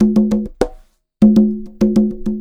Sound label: Conga Loop (100BPM); musicradar